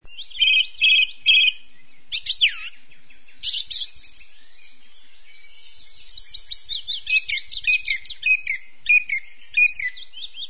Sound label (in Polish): drozd śpiewak z piecuszkiem; wroblowe; turdidae; turdus